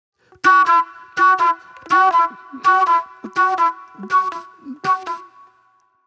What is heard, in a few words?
Classic Soul Flute